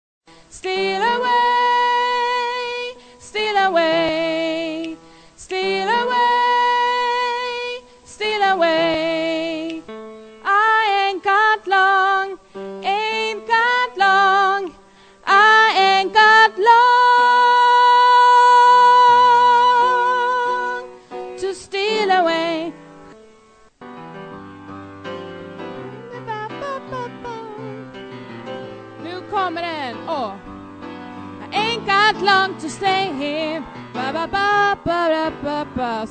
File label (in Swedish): bas, stammor